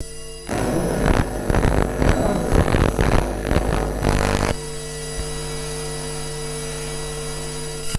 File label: pulsating electromagnetic static and hum; magic scifi